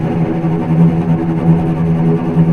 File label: VCS TREMOLO, string; East West - The Ultimate String Collection